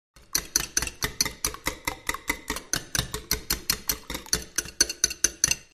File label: Hand whisking glass bowl with steel spoon